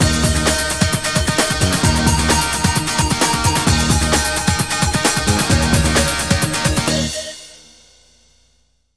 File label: Jingle